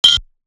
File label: blacksmith